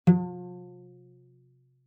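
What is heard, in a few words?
Cello pizz fortissimo sul G F3; MIS Pitches - 2014; Strings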